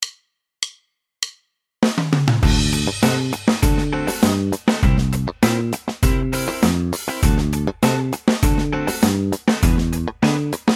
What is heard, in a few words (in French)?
main droite exercice, basse; exercices